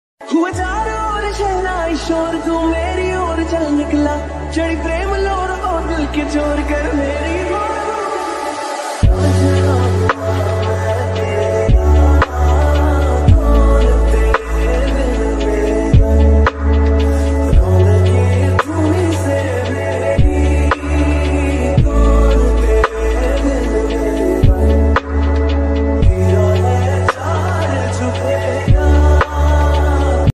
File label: lofi ringtone lofi remix ringtone